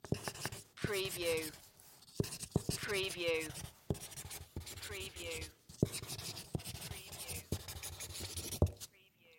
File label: writing with a hard marker